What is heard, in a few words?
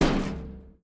bounce, freezer